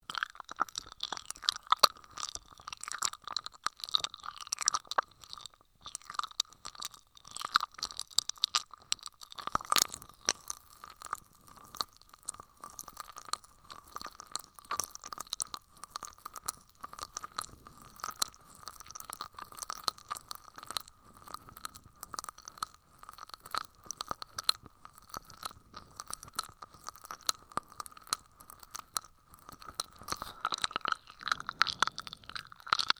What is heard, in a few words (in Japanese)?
耳舐め音; ASMR